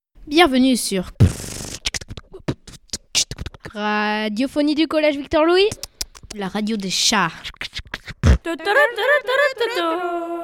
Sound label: jingle